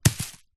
stone large drop ground leaves; foley; zapsplat

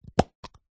household shaving foam can plastic lid pop off

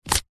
wallet leather card remove; foley; zapsplat

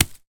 sport baseball land on grass; zapsplat

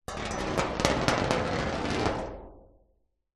metal scrape panel large; foley

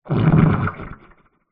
human stomach gurgle exagerated

